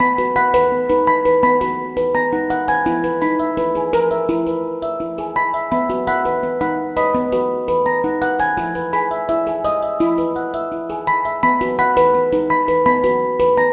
samsung galaxy z flip

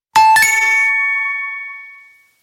lumia 650 alert

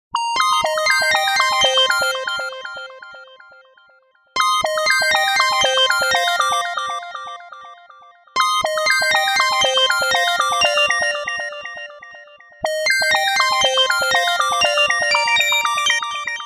Samsung S21 Trap Remix soittoääni

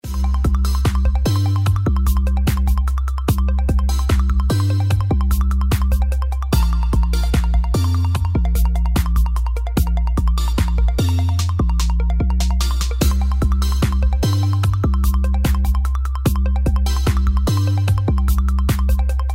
slider loop

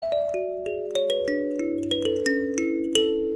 nokia kalimba

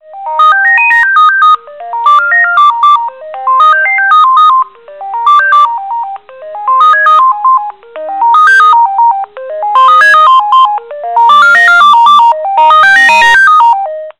siemens gigaset a585

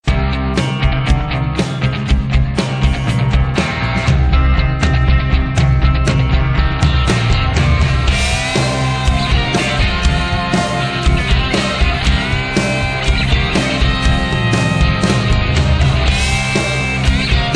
rock boost

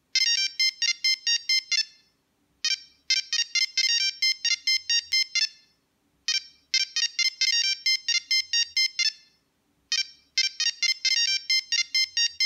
classic nokia playing iphone marimba

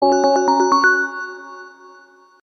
Samsung Hide Msg

